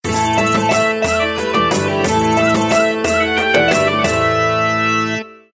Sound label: Feast, alarms; Meizu MX2